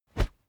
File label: whoosh wood cornered whizz; swooshes-swishes